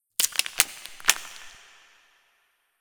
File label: loot; ankh